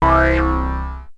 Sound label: Access Virus B; Synthworx